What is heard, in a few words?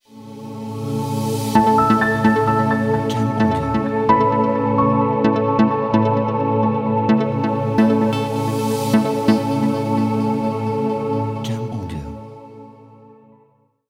Ambient Logo